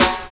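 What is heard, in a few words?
strident snare; Drums In Your FACE!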